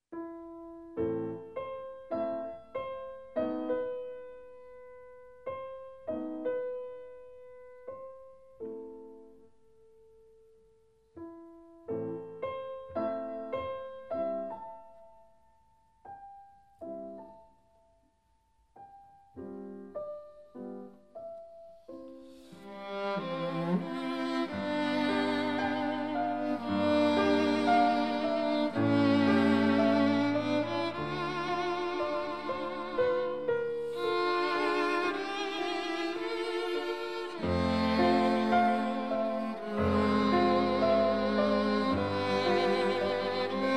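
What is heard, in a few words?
piano trio; rheinberger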